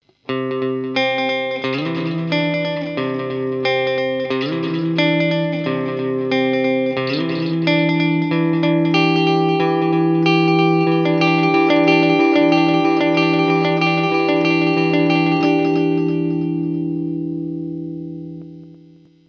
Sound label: Ping Pong